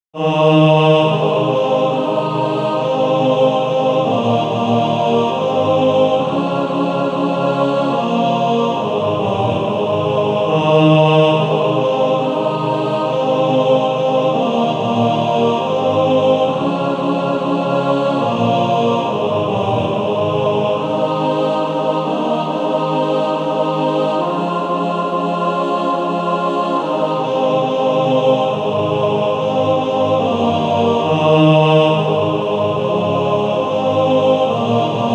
Male Choir; On Christ the Solid Rock I Stand; TTBB

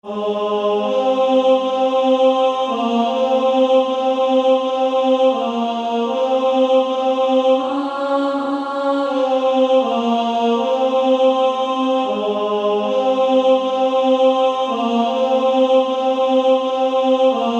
Female Choir

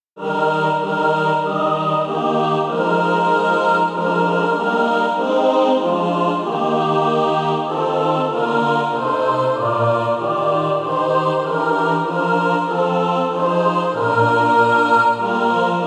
mixed

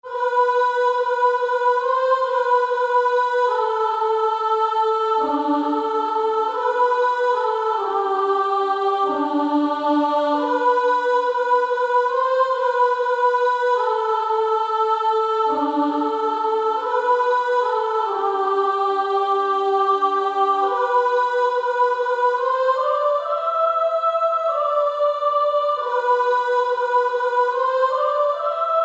Female Choir

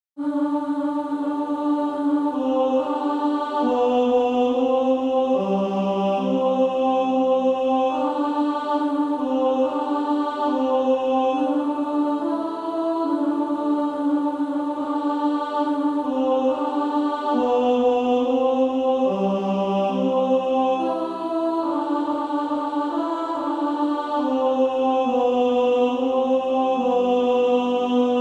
Female Choir; Give me Joy in my heart

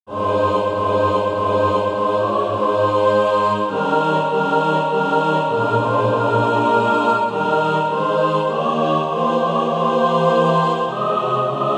mixed; SATB

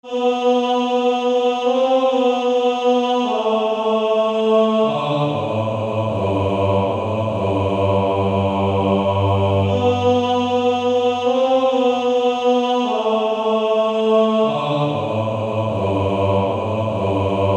Male Choir

